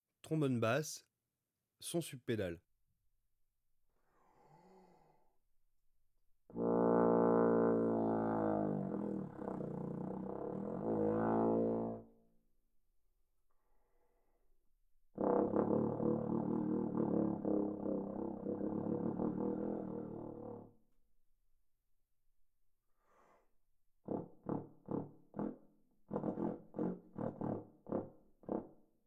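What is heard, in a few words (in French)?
basse sons sub-pédales, trombone; Textures; Sons granules faibles; traite orchestration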